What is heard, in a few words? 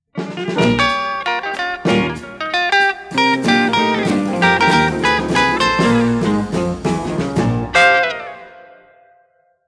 Guitar Sig; WQAM; PAMS